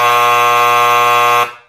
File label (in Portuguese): campainha de teatro